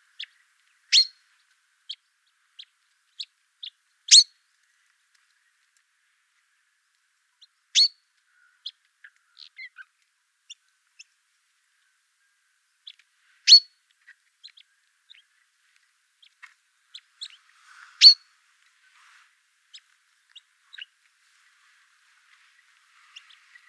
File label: call, chaffinch, chink calls of a perched bird. - SEX/AGE: unknown - COMMENT: Crossbill calls on background; ALTITUDE: +950 m.; fringilla coelebs